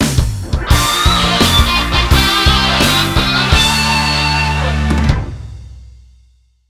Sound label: Catch Boss; big catch bass fishing 2 wii gamerip 2010